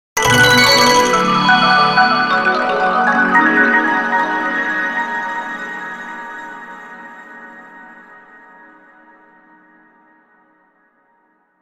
Logo Mallet Shimmer Delay; Sonic Logo; Production Element Imaging Element Accent Transition; Blastwave

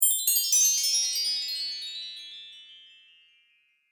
Fairy Magic Wand; Magic Sound Effects

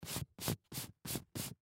Computer Chair Leather Wipe Seat Off Multiple; Computers; Foley; Blastwave

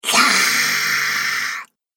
Scream Vampire, Monster Sound Effects; horror

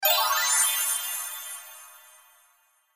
Magical Twinkle, Magical Twinkle Ascend; Cartoon

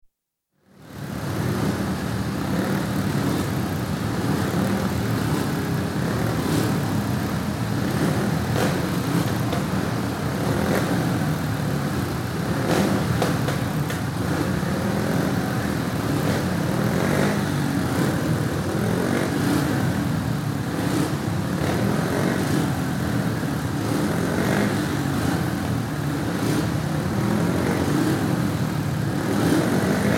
Motor Racing, saloon cars passing; Motorcycles; Vehicles; BBC

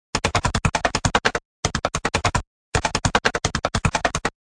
Fast hard typing on a computer keyboard.; Classroom; Sound Effects:Schools

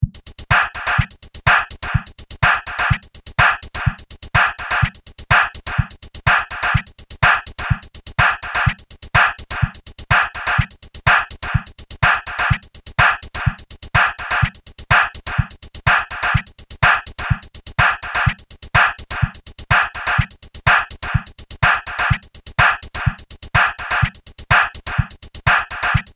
125 Bpm Dance Drum Loop; Drum Loops; Percussive And Rhythmic; Seamless loop

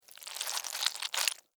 Worm, Crawl, Movement, Slimy, Wet, Insect, Bug; Animals:Insects; Worms; Blastwave

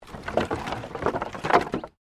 Wood Movement Minor

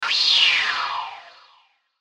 Whoosh Filter Flange; Blastwave

